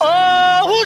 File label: "oh" sung